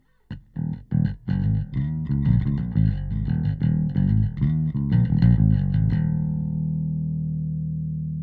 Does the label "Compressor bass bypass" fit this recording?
Yes